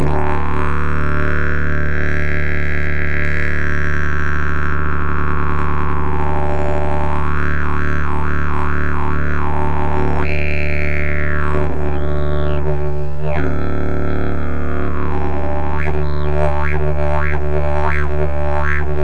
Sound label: didgeridoo; olse klokan